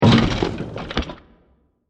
Massive Impact Blast With A Lot Of Different Particles; Blasts